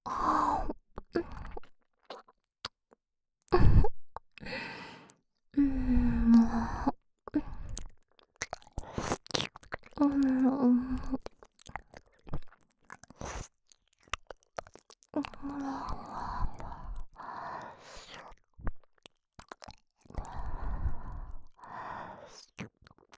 耳舐め右のみ; フレンド→ラバー

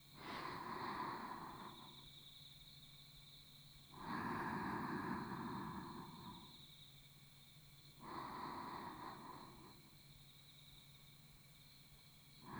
寝息（右側）

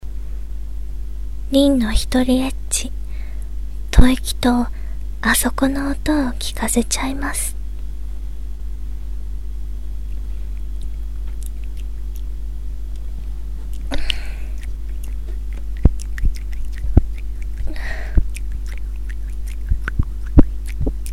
凜の一人エッチ。吐息とアソコの音を聞かせちゃいます。ボイスのみ; 生音＆実演

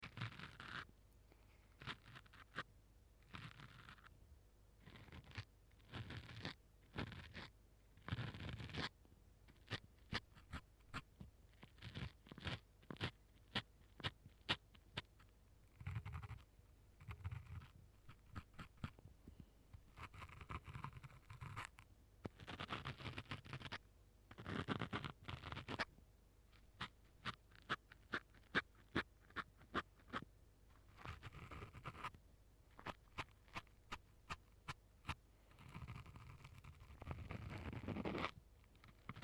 耳かき右, セリフなし

